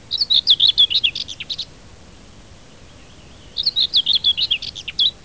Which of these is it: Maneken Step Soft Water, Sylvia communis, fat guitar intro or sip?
Sylvia communis